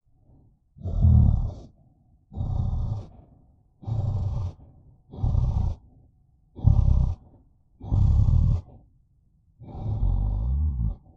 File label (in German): Drachen schnaufen